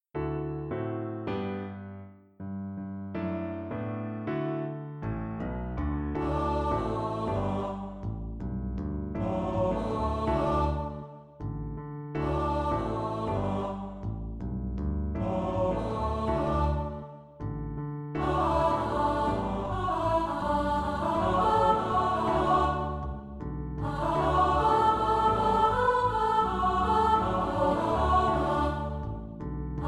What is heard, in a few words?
for Ladies Choir or treble voices